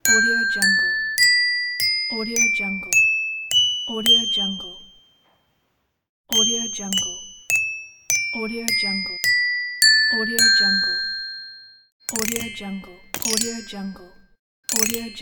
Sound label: toy xylophone sound effects